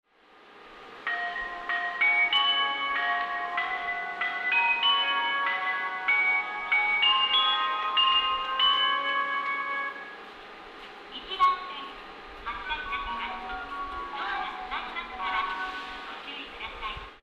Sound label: omori melody, station; jreast